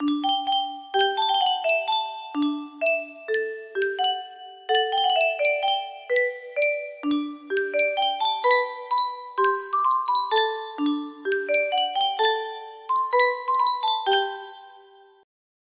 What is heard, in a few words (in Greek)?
Yunsheng Mousiko kouti